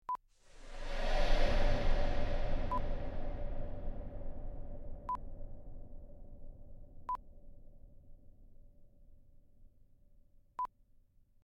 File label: Long Ghostly Whoosh